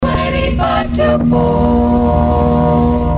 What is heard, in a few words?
jingles time chex; futursonic; 3 35 twenty five till four